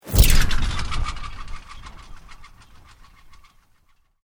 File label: Sweep Mechanical Medium